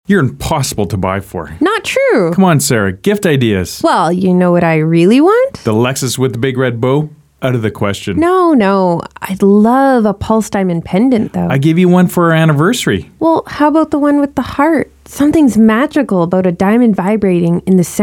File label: Pulse Powered Heartbeat; Applet’s Diamonds